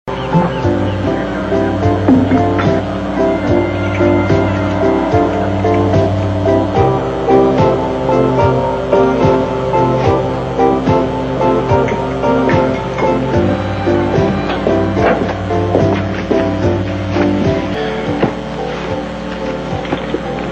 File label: restaurant music